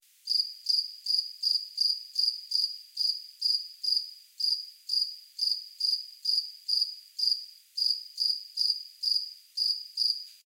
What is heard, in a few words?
Field cricket Gryllus pennsylvanicus